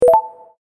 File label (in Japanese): 確認